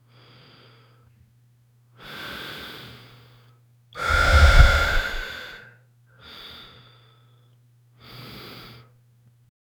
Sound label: taking a deep breath